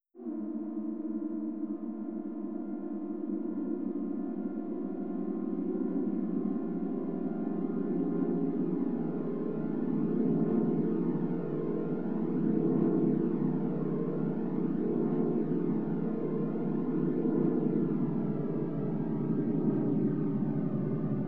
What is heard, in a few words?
pad